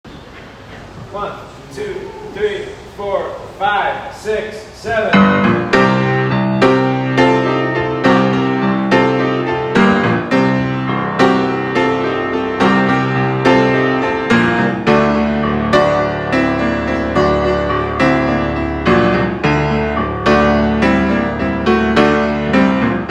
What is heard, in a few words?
accompaniment